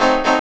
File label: P. CHORDS, KEYS